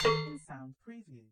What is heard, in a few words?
tonk; BALLY; TWILIGHT ZONE; Pinsound